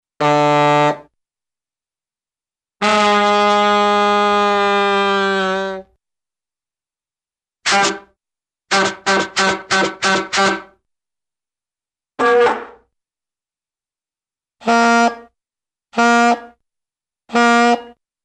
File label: Bulb Horn honk; Cartoon; Hollywood Edge; Cartoon Trax